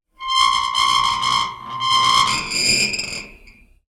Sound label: Just Metal Squeaks and Moans; soundbits